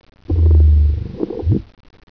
Stictocephala, treehoppers; Cocroft

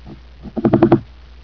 Smilia, treehoppers; Cocroft

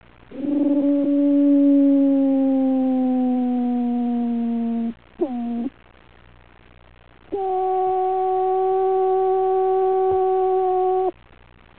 Cyphonia, treehoppers; Cocroft

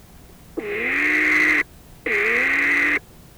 Otinotus, treehoppers; Cocroft

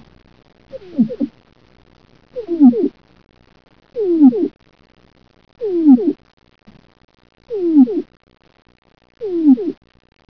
Amastris, treehoppers; Cocroft

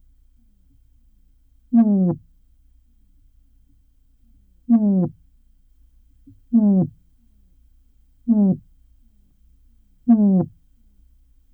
Metheisa, treehoppers; Cocroft

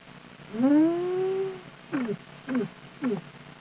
Bocydium, treehoppers; Cocroft

